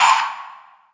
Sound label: Jungle Oneshot; Sonic Foundry (Sony Creative Software); Esoterik Beatz